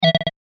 Medical Laser Ray; Laser Sound; science fiction sound effect; Andrew Fleming

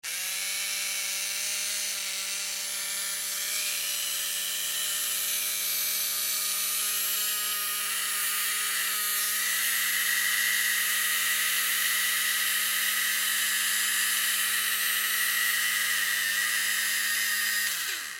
Electric Motor Small Run Long Struggles; Machines; BigRoomSound

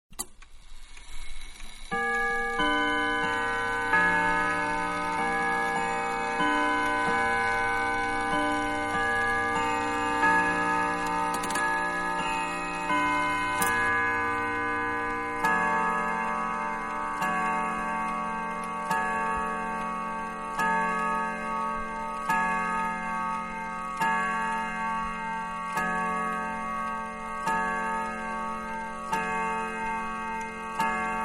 Grandfather Clock 3rd Tune; Clocks; Machines; Manhattan Production Music

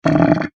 Household Sink Drain Gurgle; Kitchen

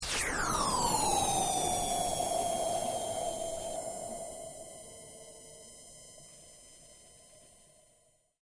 SCI FI VEHICLE PASSES; Various Sci Fi vehicle effects; Spacecrafts

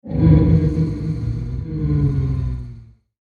Haunted Mansion Groans; Ghost Sound Effects; horror; Andrew Fleming

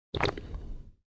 Swalw, Swallowing sound.; Swallow 1; Drinking

